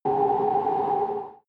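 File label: extrinsic wind, energy; energie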